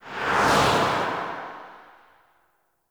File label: Reaper Travel Whoosh Right; Warframe; Wraith